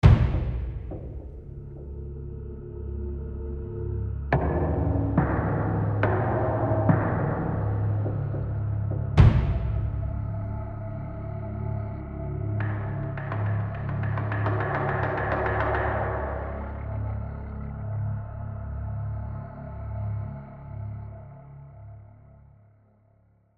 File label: Tension; AB Tension 4 High Block 4